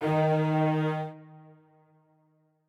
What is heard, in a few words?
strings